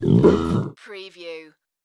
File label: creature vocal